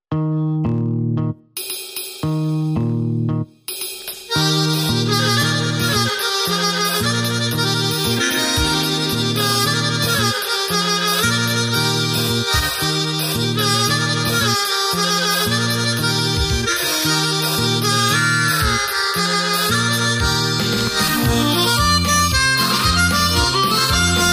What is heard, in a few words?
2 step polka